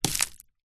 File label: stone small land on dirt road small stones